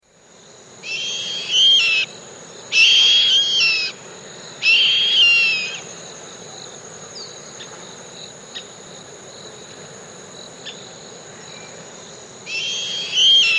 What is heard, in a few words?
White Hawk, S. America